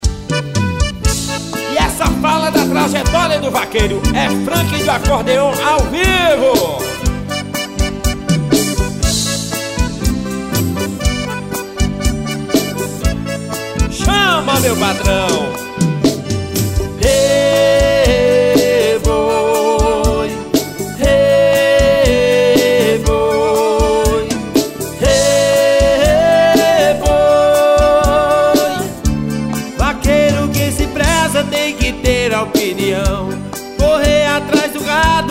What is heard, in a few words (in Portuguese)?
acordeon